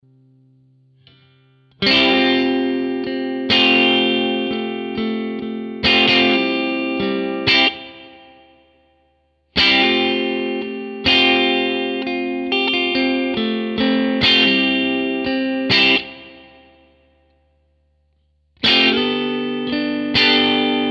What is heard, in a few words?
Bridge & Neck, guitars